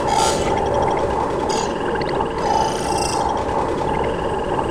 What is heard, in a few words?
computer console, ambience computers; Quake 4 Alpha